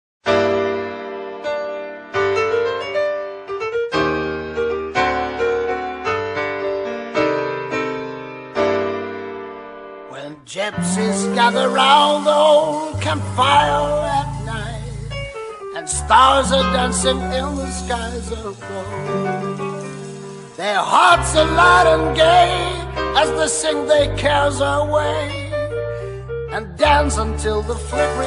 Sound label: romany violin ringtone